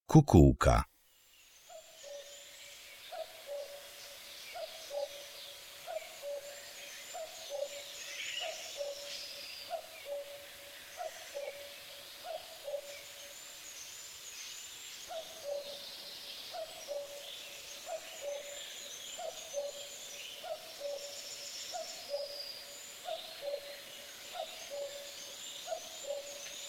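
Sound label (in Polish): KUKULKA, cuckoo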